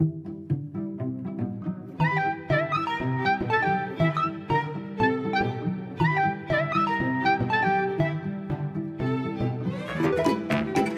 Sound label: Ridiculous Ambient